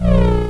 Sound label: hum; XDM; ambience